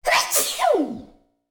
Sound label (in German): Hatschi, Klingelton